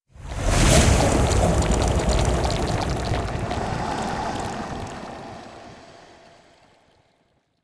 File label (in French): Coprs Vomi; Puddle